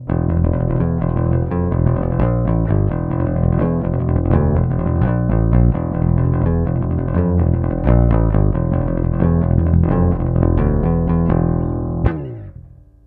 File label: Thrash Metal pick